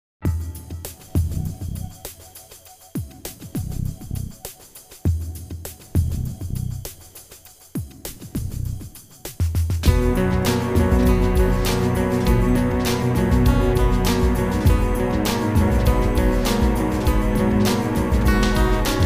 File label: Piano